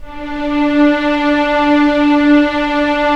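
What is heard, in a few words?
STR String Section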